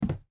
footstep burlap wood jump land; Rust

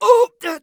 hit; Escape from Tarkov; scav3

